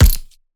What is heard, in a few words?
Punching Perc, Straight Glove (Chain); PROCESSED; 99Sounds; Punching Percussion